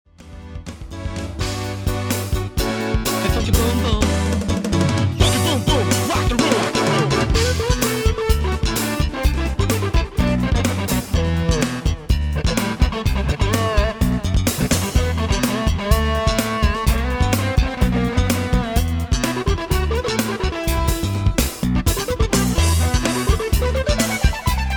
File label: evi solo